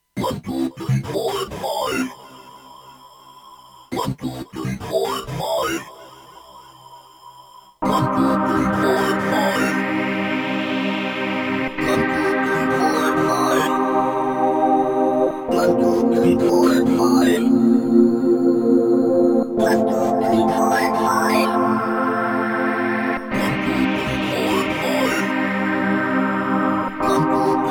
pad; solaris andromeda wt

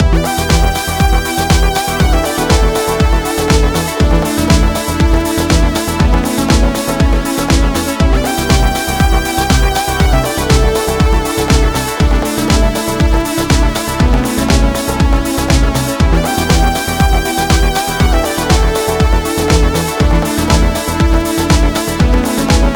moog wood

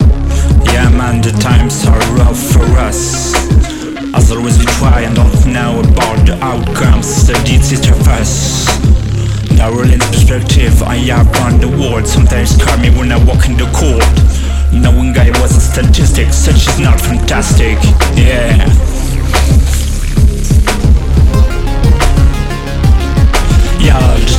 rap

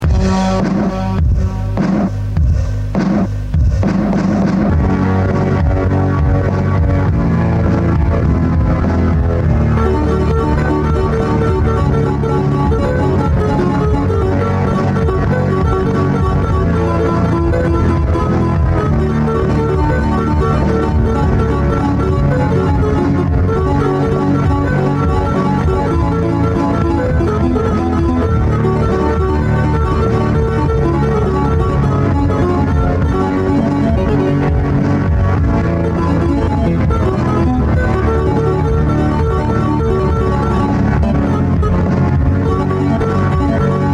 guitar (synthetique)

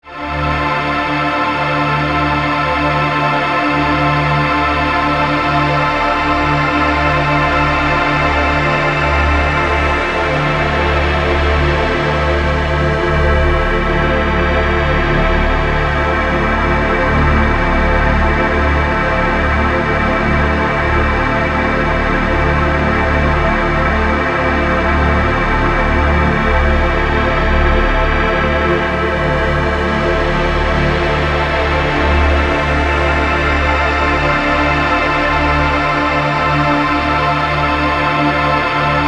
pad; helix

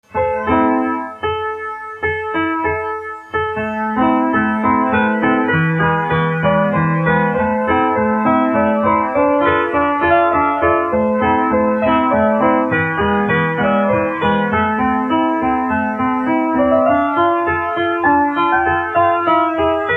piano

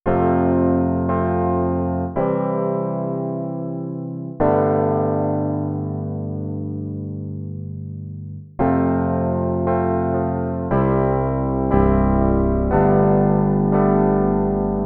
test jup8v4 piano

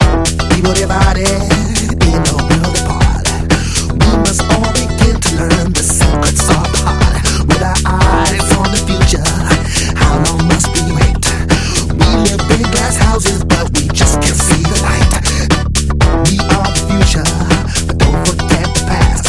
disco funk

